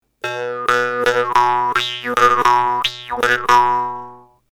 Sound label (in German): maulltrommel morchang kheta